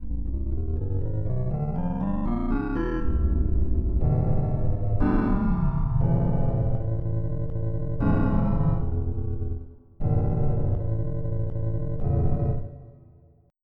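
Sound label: Loop, synth fx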